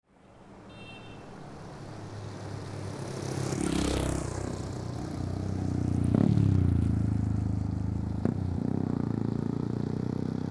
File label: city Ambiance